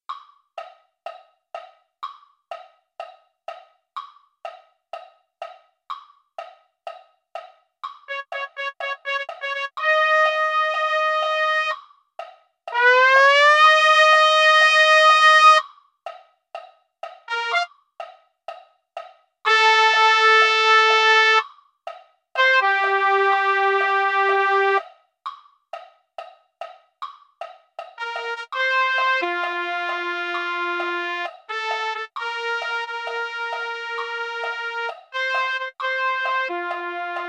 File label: trumpet; concert corps